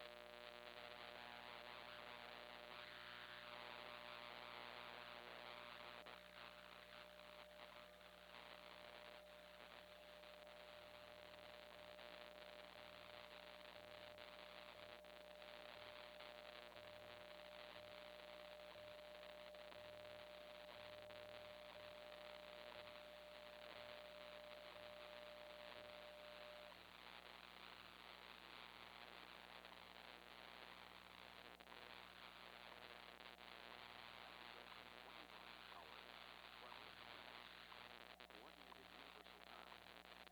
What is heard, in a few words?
powerline noise intermittent 15MHz; PGE